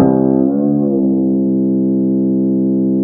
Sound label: Koto Bend C3, Fairlight CMI IIe; Guitars